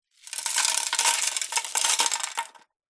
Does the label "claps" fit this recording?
No